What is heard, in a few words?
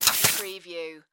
Spider Web Shoot